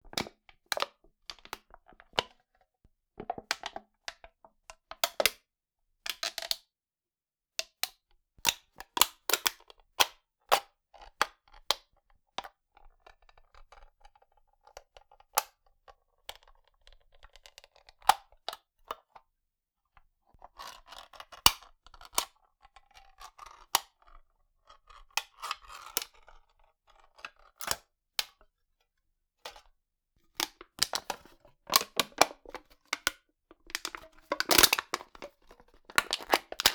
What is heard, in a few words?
Can Crush, Drinks Can